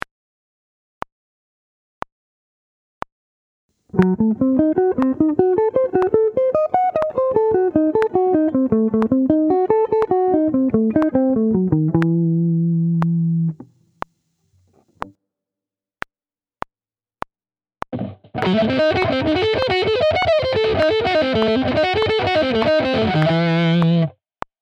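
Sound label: Sweep Picking, guitar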